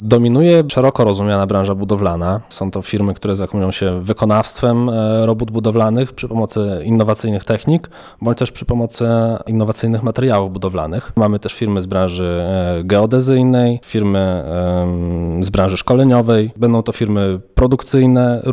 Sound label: techno